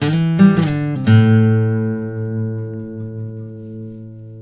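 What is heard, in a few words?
baritone lick A, Blues Guitar; ILIO; Fretworks; 108 BARI LICK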